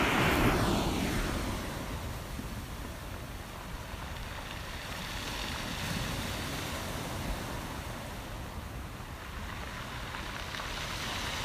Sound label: Fish Factory Waves